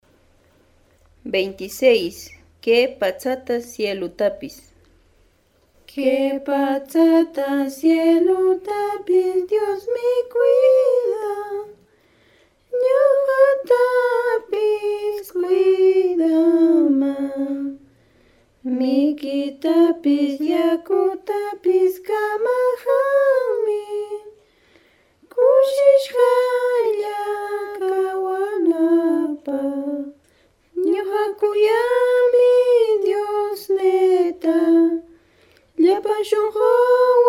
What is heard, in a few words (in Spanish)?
cancion